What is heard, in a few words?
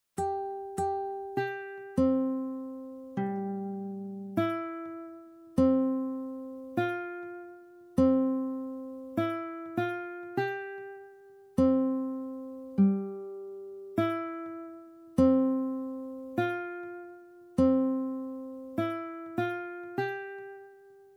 Repeats Start Repeat End